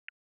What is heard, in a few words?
2kHz Octave filter